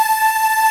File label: Ending loop